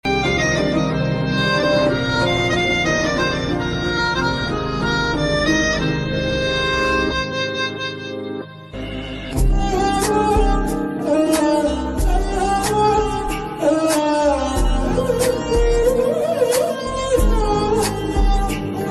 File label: sad broken ringtone